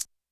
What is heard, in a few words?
Maracas; YAMAHA RM1X